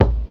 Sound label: smooth kick single shot a key